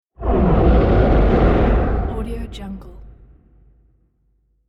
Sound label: fire breath dragon; volkovsound